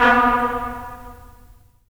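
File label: brass trumpets staccato, left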